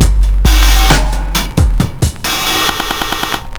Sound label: Slomo 67 bpm, breaks; Zero-G Beneath the Planet of the Breaks